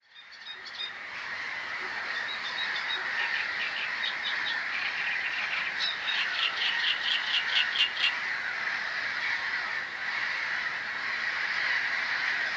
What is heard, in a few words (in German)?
kolonie